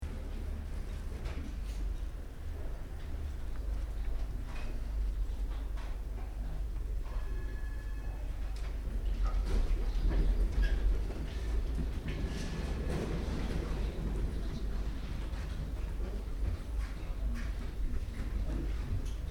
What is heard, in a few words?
Library, Interior Ambience: Small, Quiet; Building Sound Effects; Buildings (Sound Effects:Backgrounds); Stuart Duffield